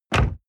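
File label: sports car exterior door close medium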